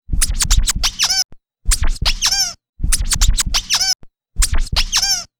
Turntables, Loops

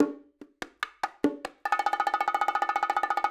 Acoustic Percussion

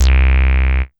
Synth Bass Single Note; Basses

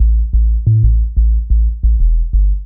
Synth Bass; Basses

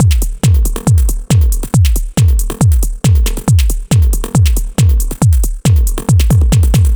Dance Drumloop; Drums; Dance Beats

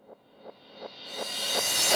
Cymbal Loop, Dance Beats; Drums

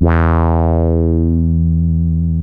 wah saw, yamaha rm1x synth

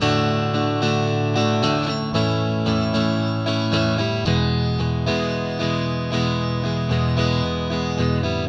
Acoustic Guitar; Guitars

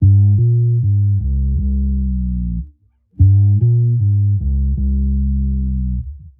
Electric Bass; Basses

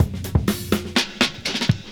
Old School Break, Drums

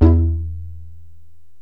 pizz bass, yamaha rm1x synth

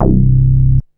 BASS; SoundFactory; SMPMANIA

